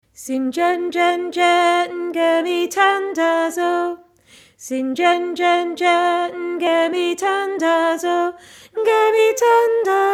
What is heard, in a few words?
melody